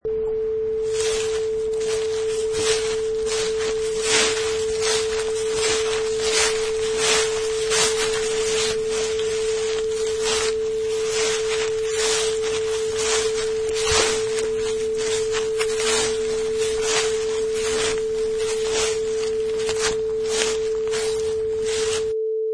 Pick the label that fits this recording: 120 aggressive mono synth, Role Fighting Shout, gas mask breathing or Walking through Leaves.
Walking through Leaves